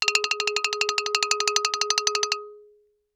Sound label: Xylophone rosewood roll ff Ab4 stereo; MIS Pitches - 2014; Percussion